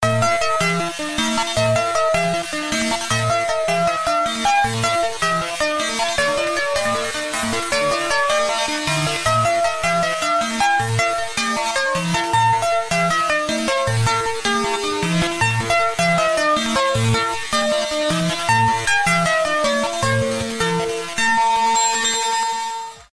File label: 8bit; Kuba